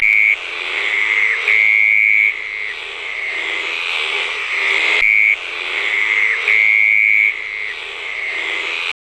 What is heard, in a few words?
Ceratophrys cornuta